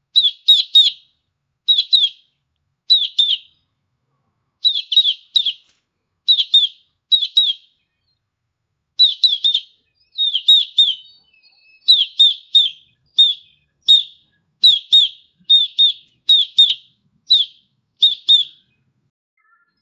sparrow chirping

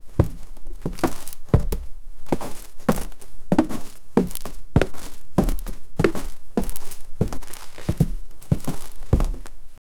footstep of basket on pla, regular

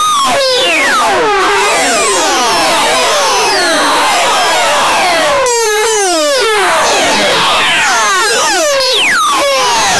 the sound of a playing, dealing

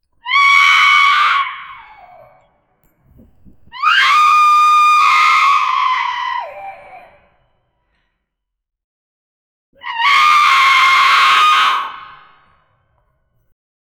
deep screaming scary scre

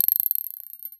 soft crystal click; slots

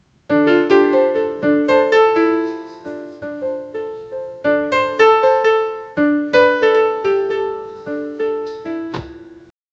a man playing piano strin, string

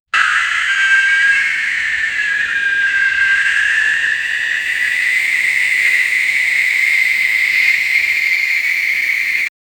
inhuman screech hiss, monster vocal

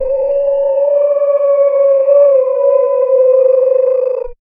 the resonant call of a, calling

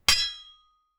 punchy deep and crisp swo; fighting

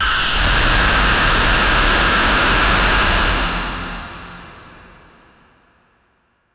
loud electro shock sound; electric

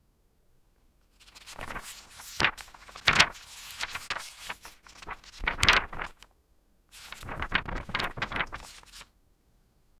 pages of book flying, paper

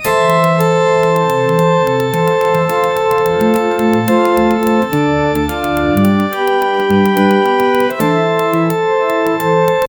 a stage ready tune, 80s

